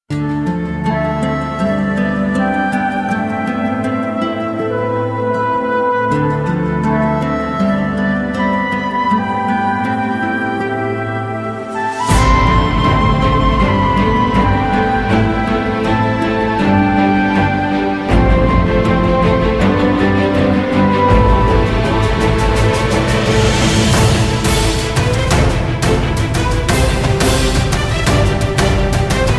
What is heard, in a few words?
start